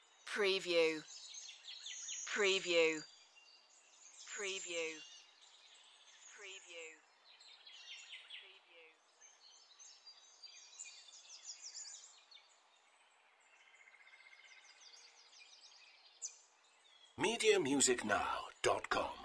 Nature Birds Loop, Nature Birds Morning Loop; lynne-publishing

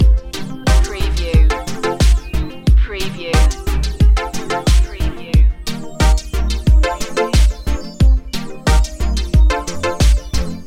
moving reggaeton loop

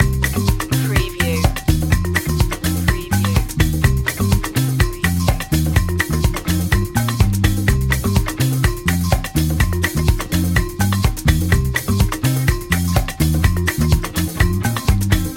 african party loop, Tribal; world influences; tribal influences ideal for creating a rainforest or jungle tribe

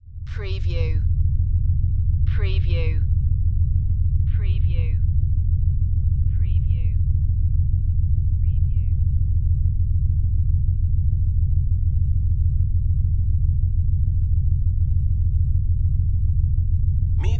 Power Drone Steady; Sound Effects Bible

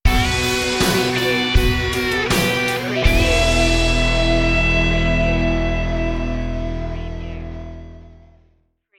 stinger, Rock Moderate

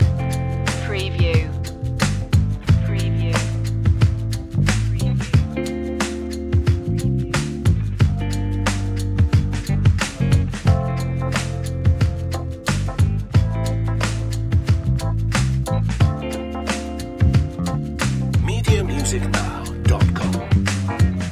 jazzy chill relax loop; chill hop

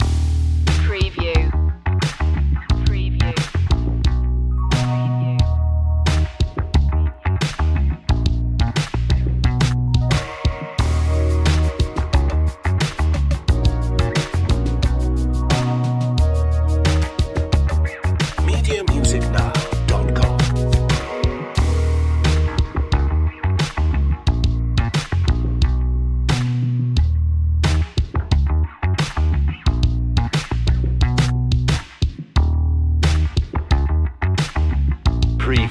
night ride loop; funky groovy

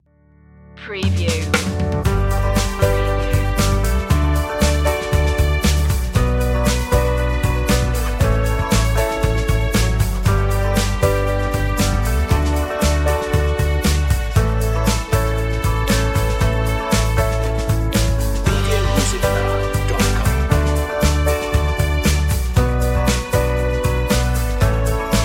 easy going, corporate, motivational

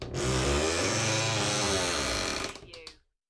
Shower Door Creak; bathroom; household sounds; beanstalk audio

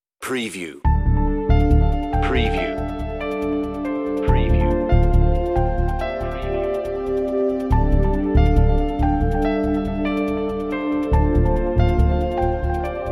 Reflective Alt mix, on-hold music

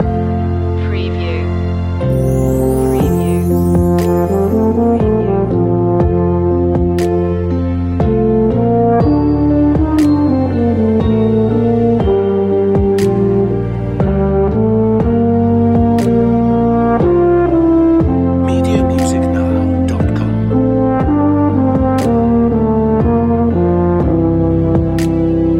away in a manger euphonium feature; christmas; traditional

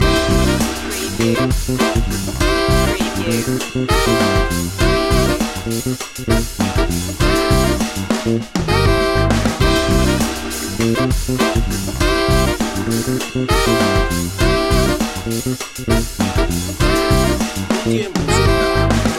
funky loop

